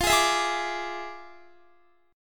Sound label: F Minor Major 13th, F, Guitar; strummed; chordshape